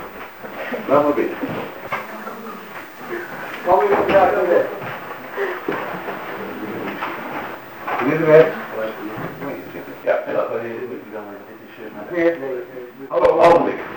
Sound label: clash